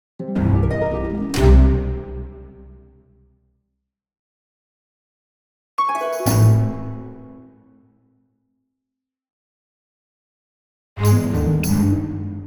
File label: Orchestral Jingle Negative